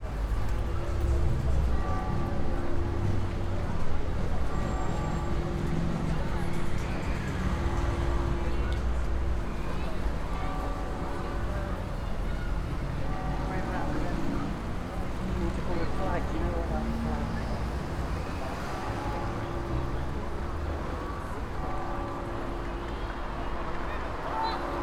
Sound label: ringing of bells at katedrale riga